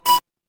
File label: ui alert